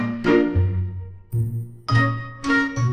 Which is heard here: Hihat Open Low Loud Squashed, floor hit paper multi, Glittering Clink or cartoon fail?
cartoon fail